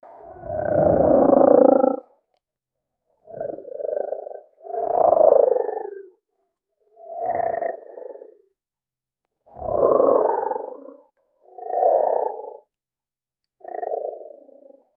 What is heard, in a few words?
little leopard growls; animal